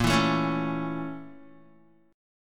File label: Bb Minor Major 7th Flat 5th Bb down Guitar; BbmM7b5; strummed; JGuitar